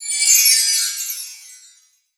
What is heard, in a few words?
Exile Magic